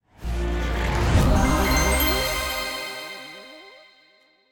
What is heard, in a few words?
free Spin Intro; netent; dracula